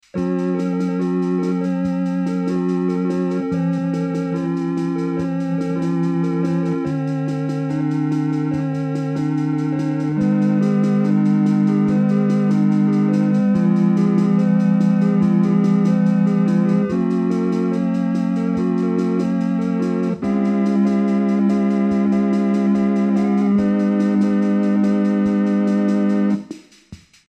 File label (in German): eko akkorde; eko micky